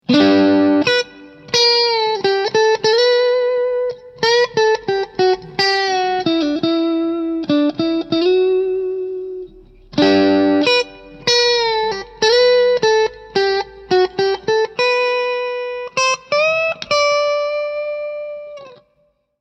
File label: Silver Grade Neck Mid, guitar pickups